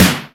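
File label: POWER KITS, snare; 90 sSampleCDs; Crypton - DrumBase5000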